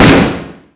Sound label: Henchman Fire; Playable Tutorial Level; TR2